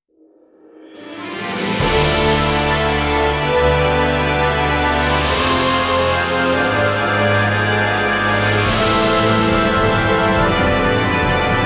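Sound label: background music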